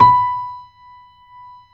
GRAND PNO B5

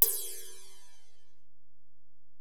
Ride, Alesis DM-PRO, Drum Machines

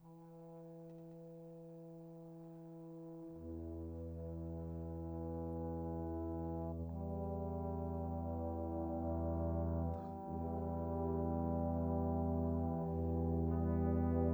Brass; Sonic Foundry (Sony Creative Software); Orchestral VOL-2

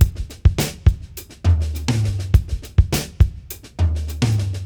Beat Loops; Zero-G; Monster Beats

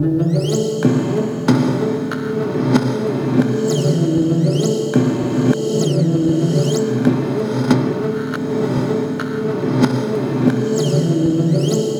ASTRAL ZIP, Synthy Tonal; Distored Reality

